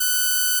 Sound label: Inharmonicity F#6